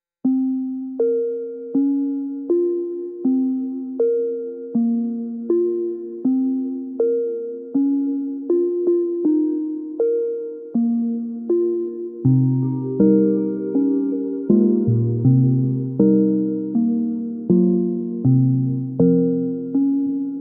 loneliness void, music; emotional

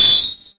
bat screech; Creatures

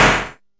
critical hit; Combat; combat impacts

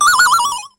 fairy giggle; More Creatures

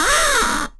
blind dark, status effects; freepixel

